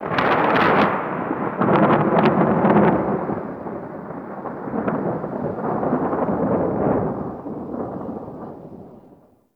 Thunder Crack; Warframe; Tau

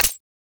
Vendor Conquest Modifier Select; Entrati

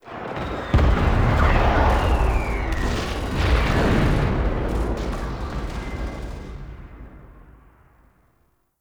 Ambient Battle Distant; Warframe; Tau

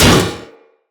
Drone Fall Impact; Grineer

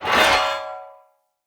Great Sword Whoosh Metal; Weapons; Swords; Dendrit Ara Great Sword

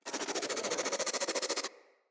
Infested Crawler Attack, Enemies; Crawlers

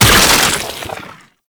Infested Fishing Fish Kill; Spear; Weapons

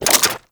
Infested Vomit Gun Reload; Warframe

